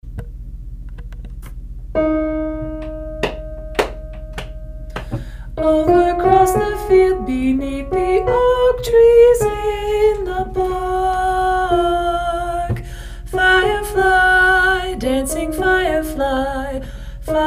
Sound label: duet alto